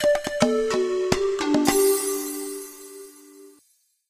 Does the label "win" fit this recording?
Yes